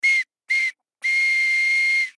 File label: Toy Whistle Blow, Toy Whistle Blow Multiple; Children; Human; Foley; Blastwave